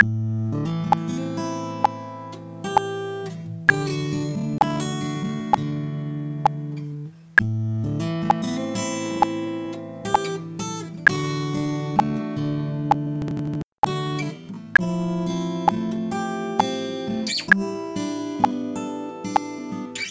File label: poti click